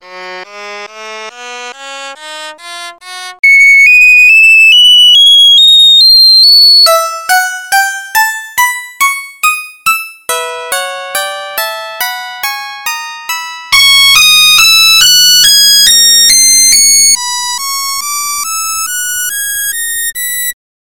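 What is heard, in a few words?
C sharp melodic minor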